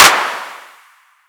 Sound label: drum hit clap